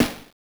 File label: Snare, Genesis; TMNT Tournament; M8